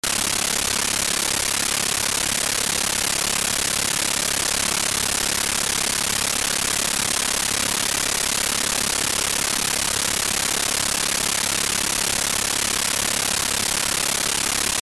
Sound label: Display Bad Signal, vhs; ultimate vhs pack; Videohive